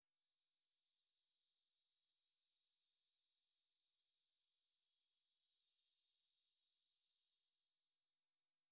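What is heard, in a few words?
SFX Bank, Call And Response Yellow Fill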